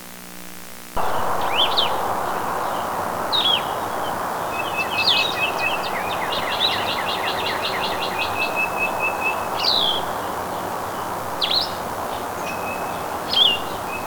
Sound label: vireo